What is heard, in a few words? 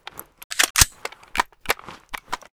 hk416 misfire